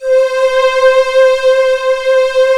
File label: ELG STR C4, ELE STR